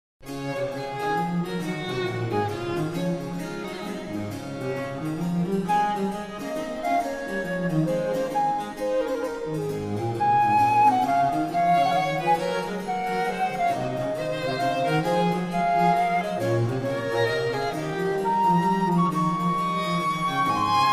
Concerto in D major for traverso violin cello & bc; Antonio Vivaldi (PD)